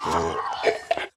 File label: Male Death; Project Zomboid